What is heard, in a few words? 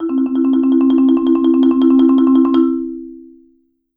Marimba roll E3; Sampleheads - Dave Samuels Marimba & Vibes